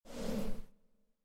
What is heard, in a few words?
zoom In